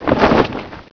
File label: zombie chinese jump; nst zombie